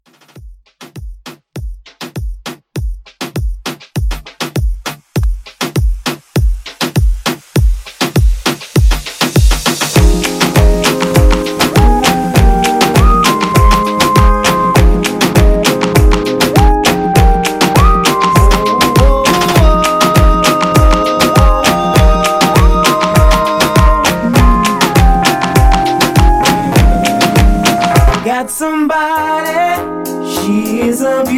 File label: Moombah Re Drum 100